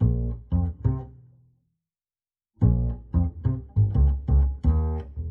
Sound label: bass, Loops